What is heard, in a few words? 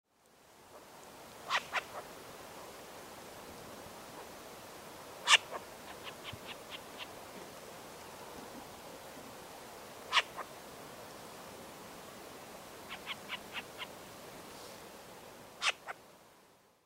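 Short-eared Owl; koe; akita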